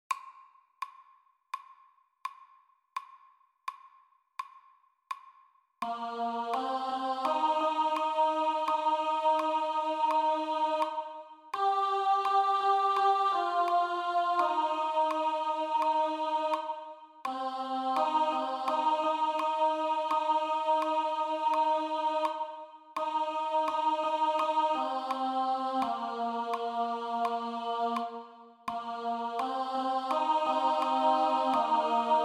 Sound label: This Little Light of Mine click track Alto